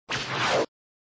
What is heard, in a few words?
Sound Whip sound (Replay), flipper